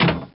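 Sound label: Bumper, pinball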